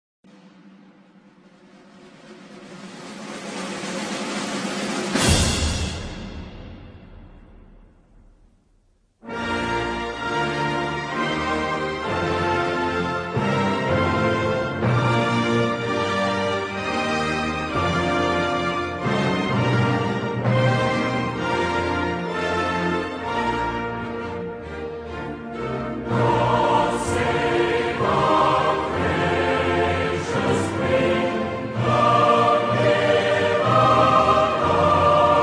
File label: British national anthem